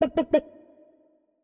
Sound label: Chicken; Legend of Keepers; Chicken V O